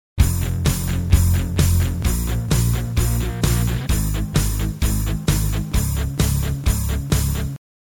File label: Use Rhythm & Palm Muting; Power Chord Lesson